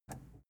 plastic button scratch release enable

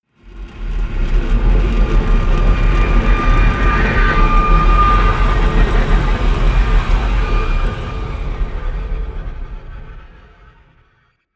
spaceship spaceship grind large

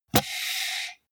computer mouse draw drop

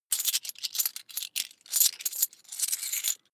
coins big clatter fast long

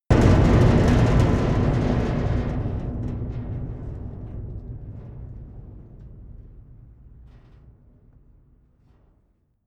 big metal impact rattle

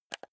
console controller joystick press fast

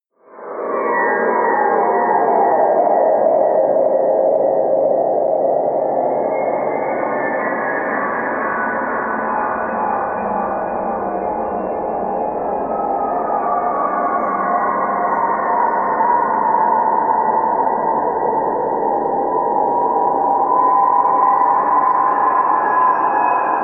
abyss pad

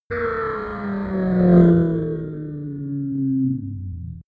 space passing fighting racer

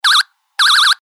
toy laser

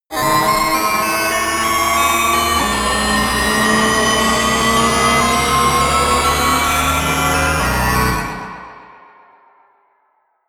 racing car synth

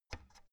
oven knob turn soft

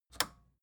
record player power push on

